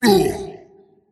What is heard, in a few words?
pain; tiny